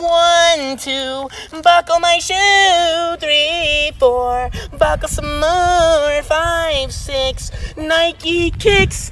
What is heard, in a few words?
doorbell sound